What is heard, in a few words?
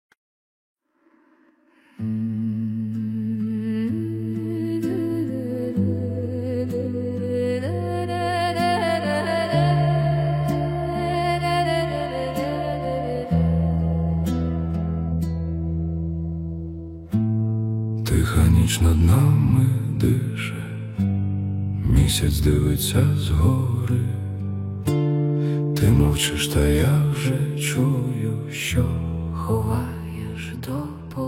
ukrainian folk remix